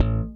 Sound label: P-B SLAP A2, BASS; Greytsounds; JAZZ+P BASS